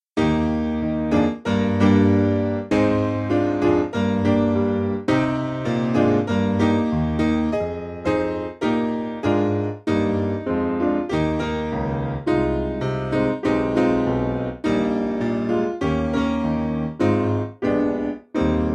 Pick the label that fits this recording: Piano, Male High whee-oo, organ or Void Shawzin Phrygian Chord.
Piano